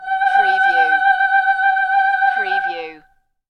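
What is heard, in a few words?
Female Opera La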